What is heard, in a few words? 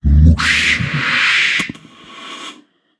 Voodoo Teleport; we are the dwarves gamerip 2016